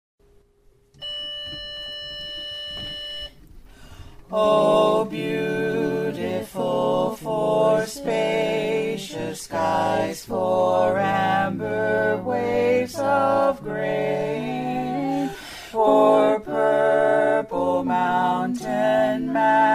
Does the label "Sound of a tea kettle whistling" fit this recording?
No